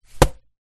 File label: leisure juggling bean bag small land on table; zapsplat